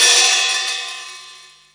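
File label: long open hi-hat; DR.ACCESSOR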